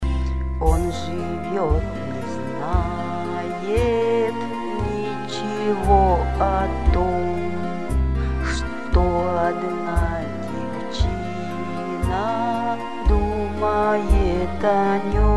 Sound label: music